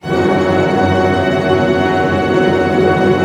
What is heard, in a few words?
TREM F# FF, orch trem; Sound On Sound - Peter Siedleczek's Orchestra